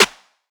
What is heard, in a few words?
snare fresh; Hip-Hop; Drums; Drum One Shots; Slate; CAICOS Hip-Hop Sample Pack